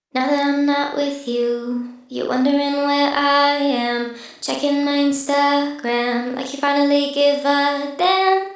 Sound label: Delay